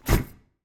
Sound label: mob formation stack